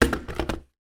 household kettle cordless place down on base unit

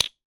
household toothpaste tube lid down on surface; sound effects one

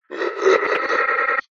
horror evp ghostbox demon laugh glitchy receiver

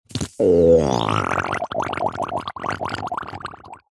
cartoon slime squelchy bubble dribble airy; zapsplat

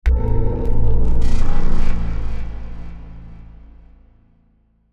hit dark pulsating drone; sound design; zapsplat

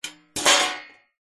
household baking tray metal impact drop

